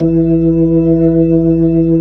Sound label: B-3 Jazz; Keyboards of The 60's and 70's